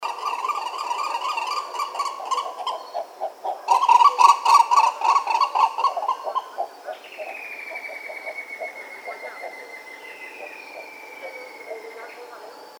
Green ibis; پرنده اکراس سبز

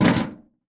foley metal box old; zapsplat

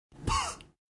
houf; هوف

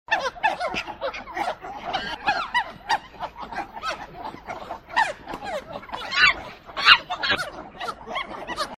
Japanese macaque; ماکاک ژاپنی

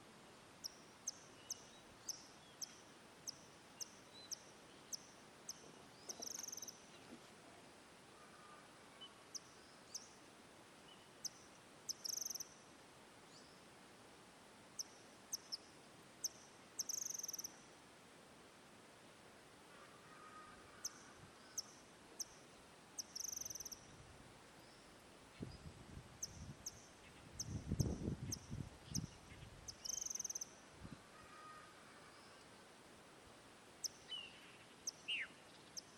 White throated kingbird

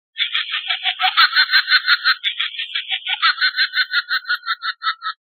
Scary doll laugh

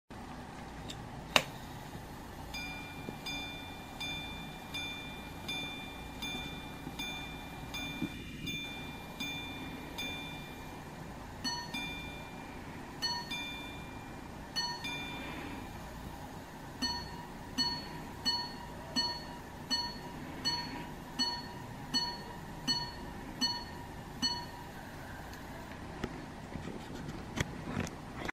pocket watch

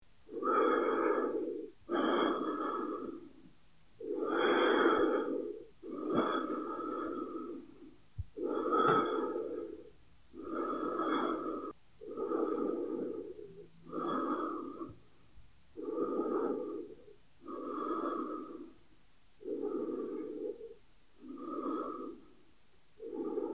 breathing air inhale exhale

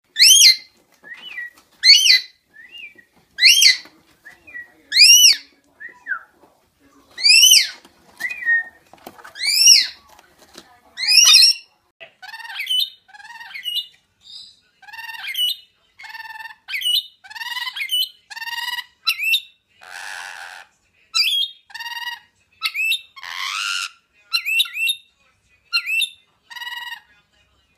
Rainbow lorikeet

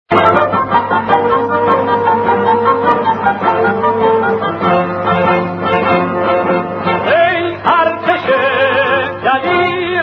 Military music

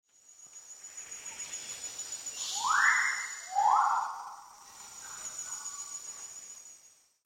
Magnificent riflebird; پرنده تفنگمرغ باشکوه

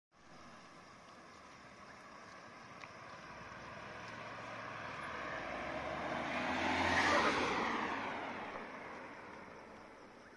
Borgward

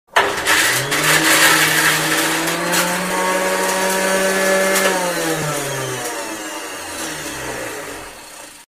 Juicing; آبمیوه گیری

